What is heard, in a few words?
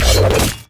foley; masteryi base